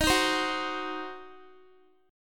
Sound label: D sharp Minor 6th D sharp down Guitar; strummed